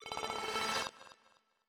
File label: TXT Tone; Virgin Mobile USA